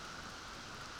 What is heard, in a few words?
Background Noise